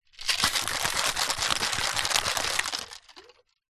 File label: food drink cocktail shaker metal shake ice liquid